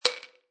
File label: Pill Drop In Bottle Single Large, Foley, Pill Dropping Into Plastic Pill Bottle, Single Large Vitamin Pill